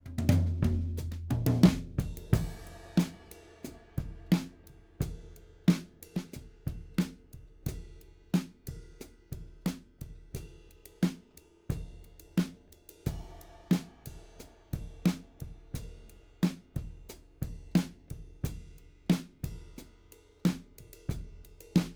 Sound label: Overheads